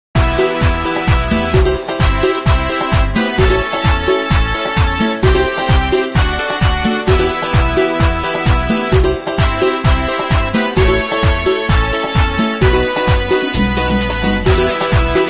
cumbia

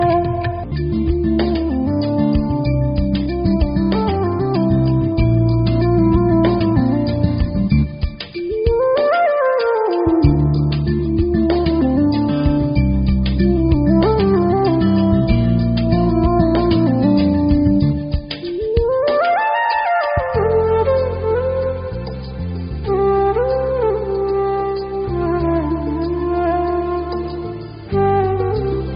Ambient Flute Mix

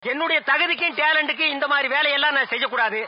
Message Tone

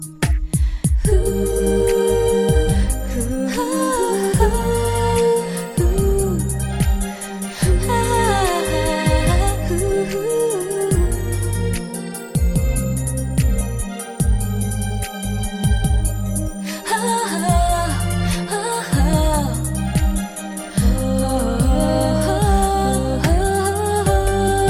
Xmas Jingle

